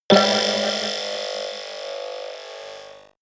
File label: mechanic alien